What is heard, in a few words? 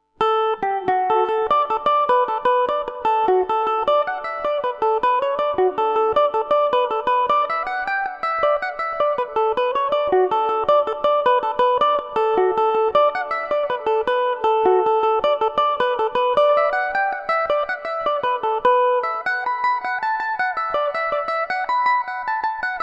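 D major revelation, Irish mandolin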